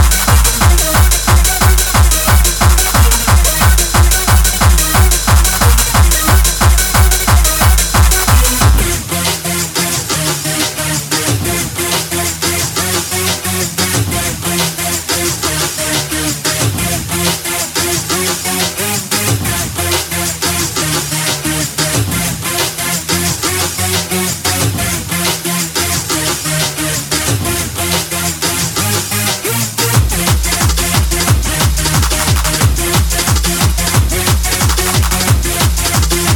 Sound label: counting kicks